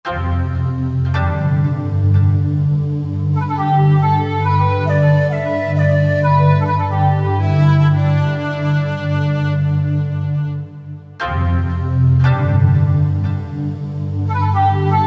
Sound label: Eastern Split, Roland JV-2080